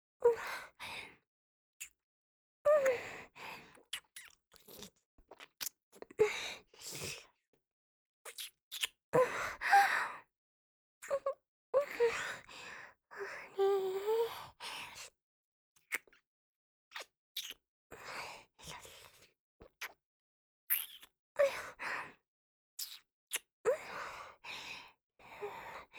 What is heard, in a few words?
kiss